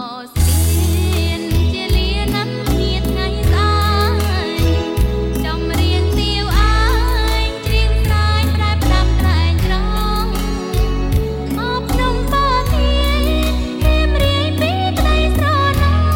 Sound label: khmer song